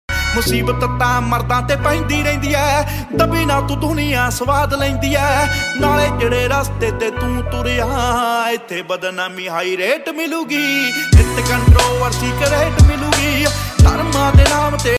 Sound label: punjabi ringtone; am music tones